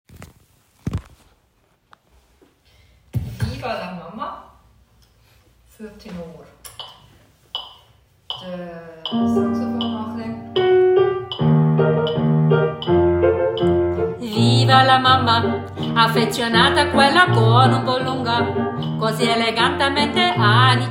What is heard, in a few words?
Tenor